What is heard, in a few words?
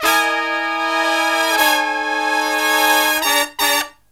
SLEEP, Killer Brass Riffs